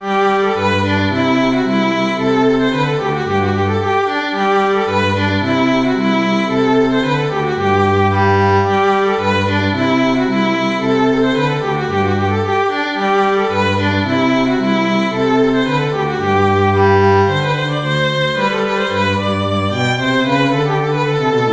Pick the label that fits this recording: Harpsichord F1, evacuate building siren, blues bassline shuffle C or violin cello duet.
violin cello duet